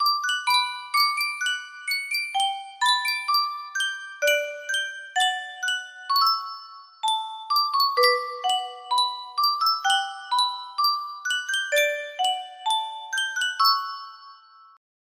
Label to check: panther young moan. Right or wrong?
Wrong